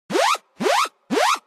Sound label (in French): sonnerie alerte tsunami